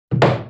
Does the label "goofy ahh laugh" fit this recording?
No